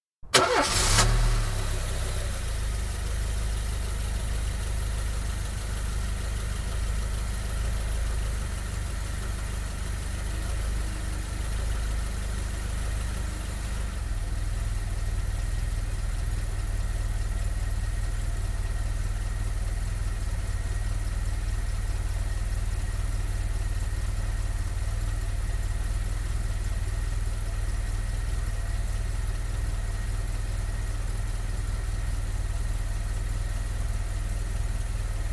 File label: Vauxhall Carlton, exterior, switch on, idle, switch off (18 litre saloon, 1986 model); Car Sound Effects; BBC